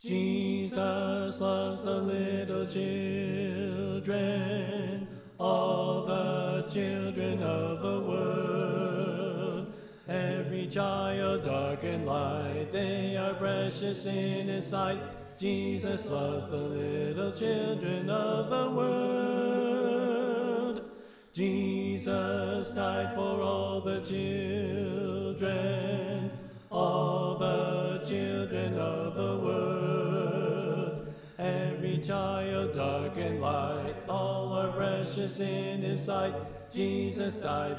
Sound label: Tenor Left